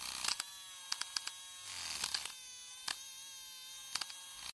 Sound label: Minicopter Loop; doodle jump 2; android